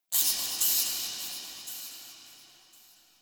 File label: Interior Texture High Pitch Screech, Infested, Ambience; Misc Indoor